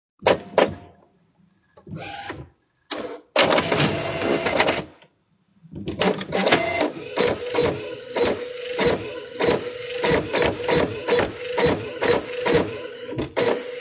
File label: Computer printer printing; Computers